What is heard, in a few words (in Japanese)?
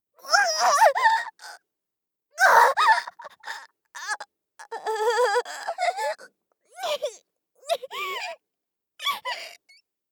ももを縦に斬る; 効果音なし